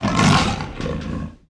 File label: leopard